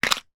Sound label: office box of paper clips put down on table